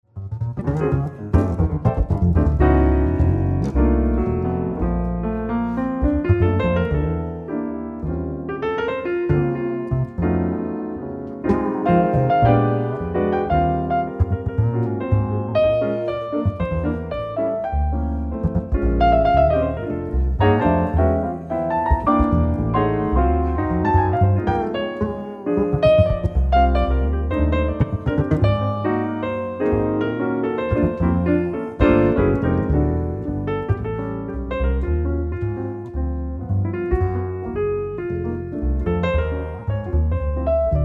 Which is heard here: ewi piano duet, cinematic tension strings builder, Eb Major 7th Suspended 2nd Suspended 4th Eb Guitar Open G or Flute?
ewi piano duet